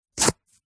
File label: foley card toilet roll rip small section